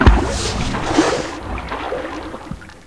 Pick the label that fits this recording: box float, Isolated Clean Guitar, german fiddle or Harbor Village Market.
box float